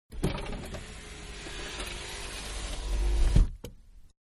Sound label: Big Car Auto Window Closing, Interior; Cars (Sound Effects:Vehicles); Manhattan Production Music